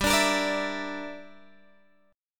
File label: G Minor 6th, Guitar, Open F; Gm6; strummed; 19,21,22,21,19,x